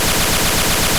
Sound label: beam